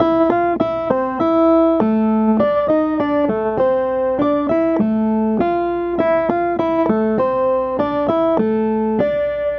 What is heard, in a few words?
Clavinet; Sonic Foundry (Sony Creative Software) - Rondo Brothers Trip-Hop Loops & Samples; El Corazon